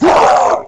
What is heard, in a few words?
die demon